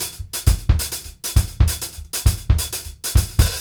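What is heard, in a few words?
SAMBA HAT, Brazil 132; Sampleheads; New York City Drumworks VOL-1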